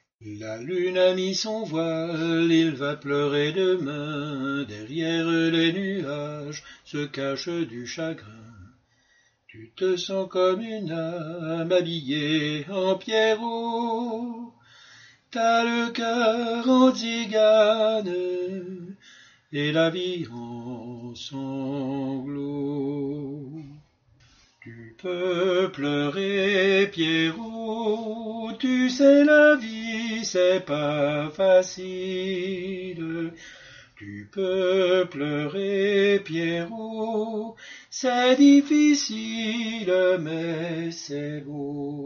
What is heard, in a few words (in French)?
soprano